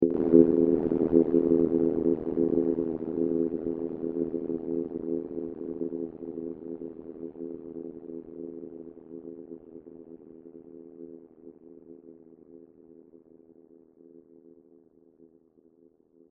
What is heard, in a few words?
cowbell